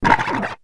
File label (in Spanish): Grito; Fuecoco